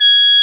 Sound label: G#4 SHENG; CHNESE SHENG; AKAI S6000 CD-ROM - WorldSounds